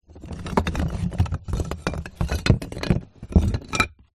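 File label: plaster pieces in cardboard box rummage; foley; plaster peices; zapsplat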